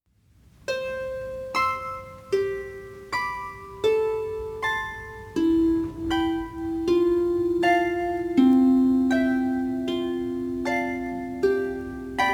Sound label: Pachabel Canon